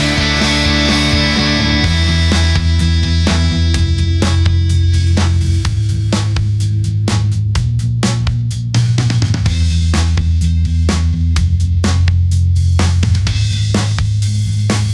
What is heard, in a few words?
energetic rock